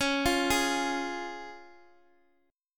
Db Major Db down Guitar DADGAD

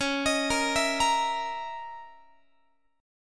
Db Minor 6th Add 9th Db down Guitar; arpeggiated

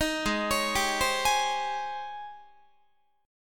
Ab Major 11th Ab Guitar; AbM11 arpeggiated; 18,16,16,17,16,19

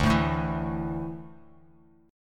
D Suspended 2nd D down Bass Standard; strummed

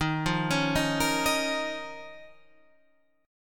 D sharp 7th Suspended 2nd; D#7sus2 arpeggiated

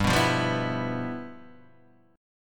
G 13th G, chord

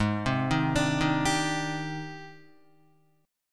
G sharp Major Sharp 11th, Guitar; G#M#11 arpeggiated; 3,3,3,7,3,3; JGuitar

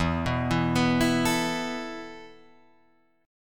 E Suspended 4th E, down, Guitar, Drop D

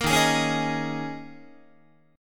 Eb 7th Suspended 4th Eb, Guitar

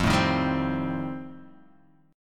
F 9th F Guitar Open G, chord

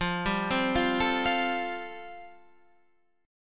A-Minor 7th Sharp 5th F

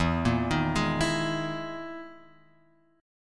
E Major 7th Flat 5th chord; EM7b5 Chord {0 1 1 1 X 0} arpeggiated

